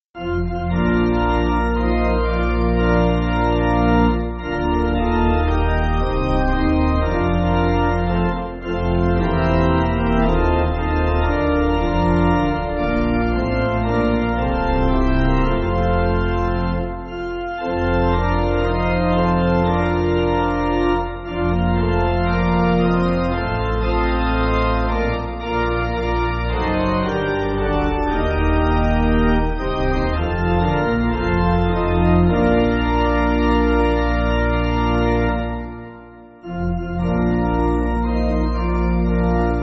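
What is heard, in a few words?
Pipe Organ, Music Backing; Even The Grave; H. Duvernoy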